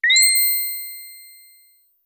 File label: pitched so high; message tones